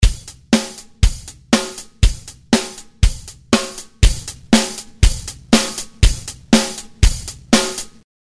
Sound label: overdrive